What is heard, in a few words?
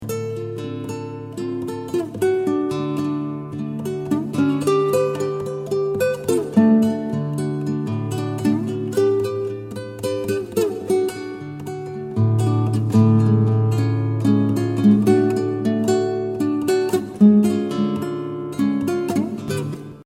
Slide Song